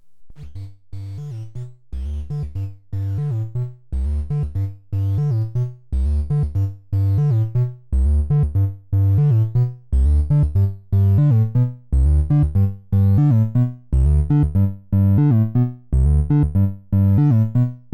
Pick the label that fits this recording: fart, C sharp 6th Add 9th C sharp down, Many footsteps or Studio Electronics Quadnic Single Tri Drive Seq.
Studio Electronics Quadnic Single Tri Drive Seq